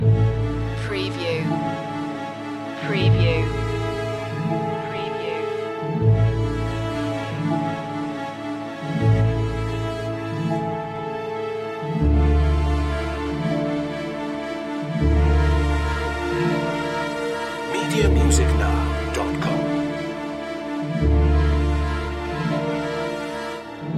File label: lake waltz loop